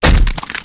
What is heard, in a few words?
attack; creep; greevil